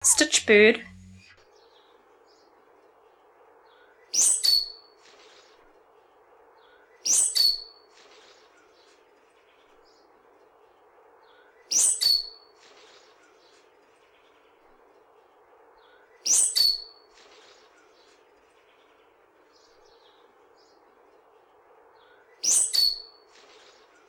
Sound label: stitchbird